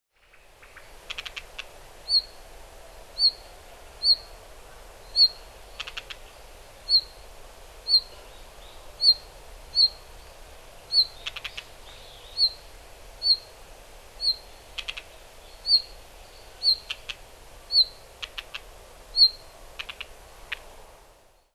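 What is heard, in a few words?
Red-flanked Bluetail; hyogo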